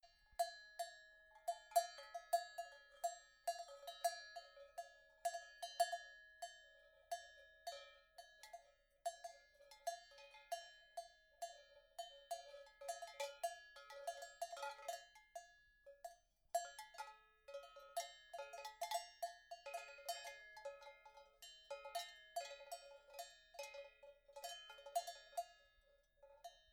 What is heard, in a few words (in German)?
Kuhglocken